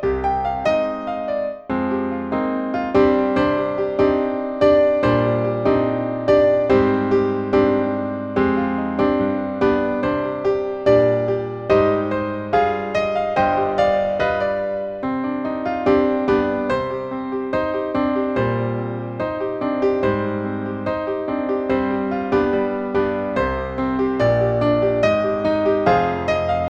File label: accomp; battle of jericho